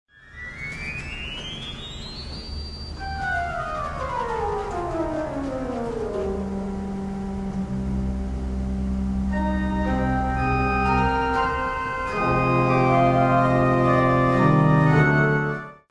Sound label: quinte